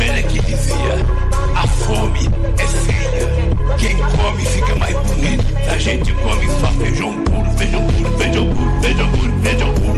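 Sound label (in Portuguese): feijão puro phonk; lula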